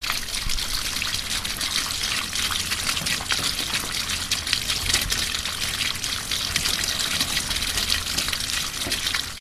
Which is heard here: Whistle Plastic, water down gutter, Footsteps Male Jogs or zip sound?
water down gutter